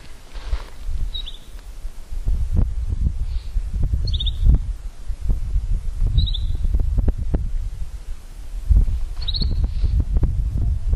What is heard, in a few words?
bird; tanzania